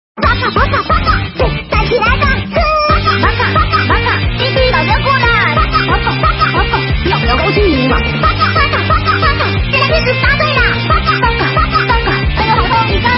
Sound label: ding gong; csgo; mvp